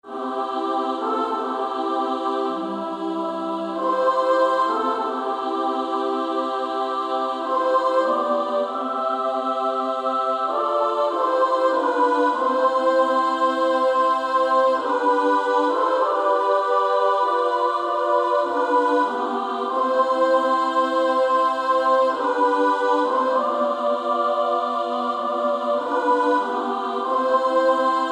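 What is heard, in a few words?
soprano soprano alto, practice music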